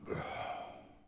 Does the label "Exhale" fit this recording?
Yes